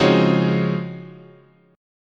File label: Db Major 11th, chord